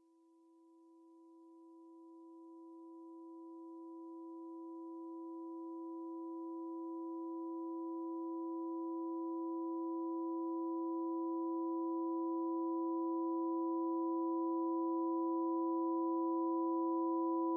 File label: Sinewave Quartet